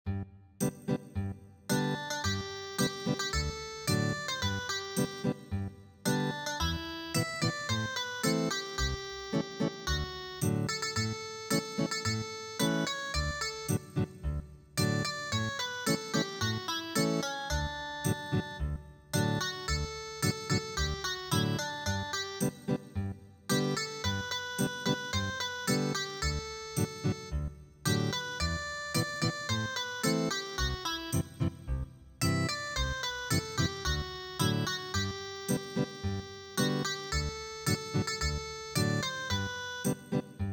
Ethnic Guitar

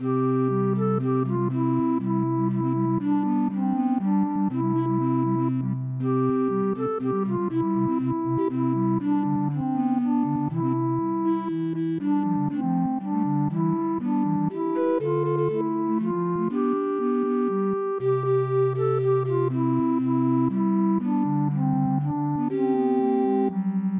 Recorder Quartet; midi; traditional

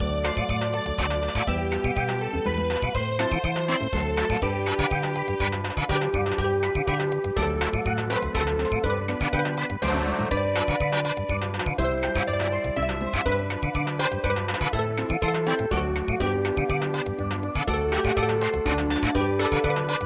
home town Samba

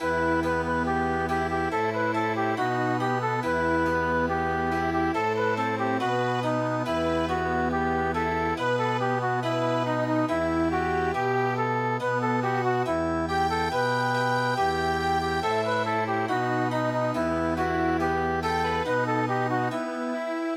Chamber Music, midi

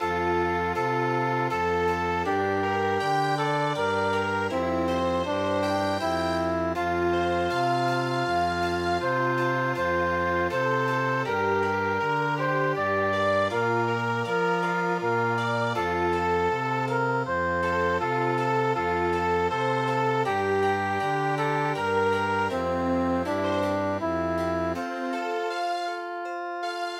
Chamber Music, midi

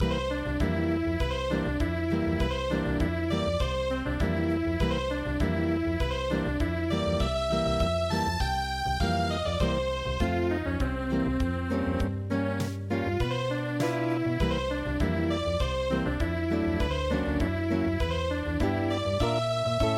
Unplugged

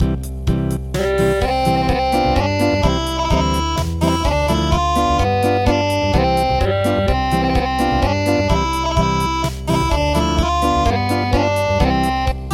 Acoustic

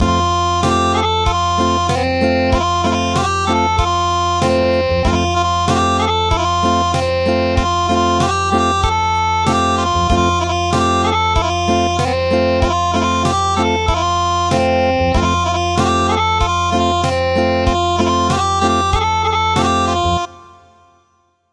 Acoustic

